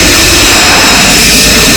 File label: rpg trail loop whip pig whistle; Modern Warfare; rpg7